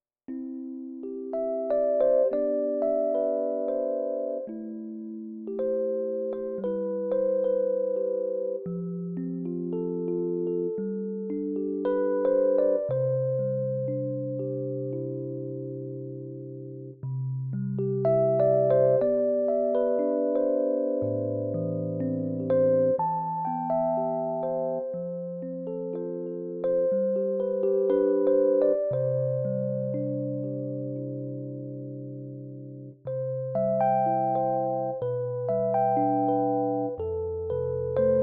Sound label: Electric Piano Version; The Evolution of Our Love; China 2018 OST